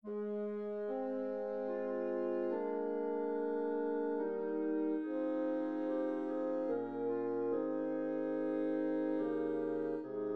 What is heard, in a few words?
Organ